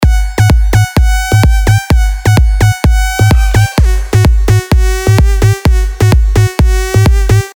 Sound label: LD, sylenth1 dirty dutch leads; Dutch Train; revealed sylenth1 dirty dutch leads vol 1